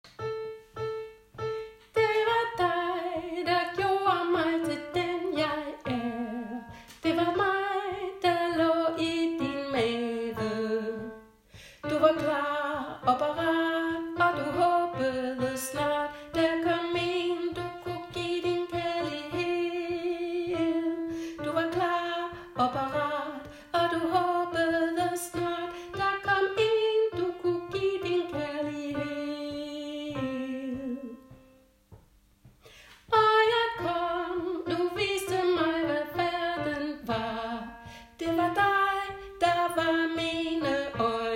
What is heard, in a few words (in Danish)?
dine øjne alt med sang